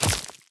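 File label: footstep spider giant; animal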